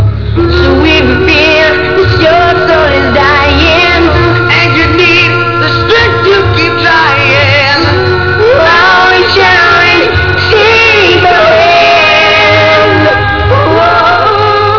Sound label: acapella